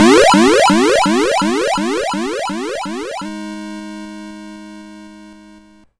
YM Arp G#m; chip hits; arps; CHIPSHOP DELUXE

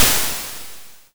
Snare, YM, CHIPSHOP HITS DRUMS; ChipShop Deluxe

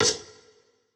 Tech Drum Hit, techno 120bpm

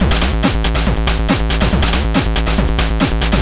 140 Combo A#m, 140 BPM; loops; CHIPSHOP DELUXE

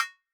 Tech Drum Hit, techno 120bpm

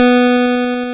GB Lead B; ChipShop Deluxe; ChipShop Hits

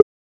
Perc, techno drum

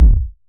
Vermona Kick

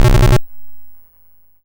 YM Quake; SFX; CHIPSHOP DELUXE; CHIPSHOP HITS

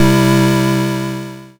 YM Chord Em; ChipShop Deluxe; ChipShop Hits

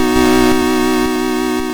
YM Chord D#m; ChipShop Deluxe; ChipShop Hits

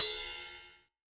DnB Drum Hit Ride; drumnbass 170bpm; Drum Kits; musicradar; Drum Hits A

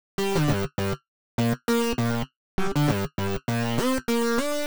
Synth Line 100 G, hiphop 100bpm; musicradar

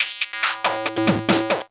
Synth E, 140 BPM; loops; CHIPSHOP DELUXE

